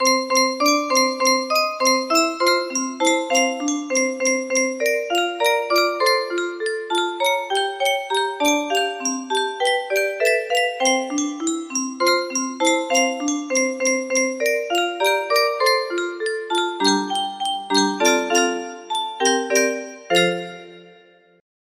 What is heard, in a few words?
music box